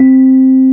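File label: Hard EP G3, electric piano; SoundFont - The Developer's Choice - Volume 1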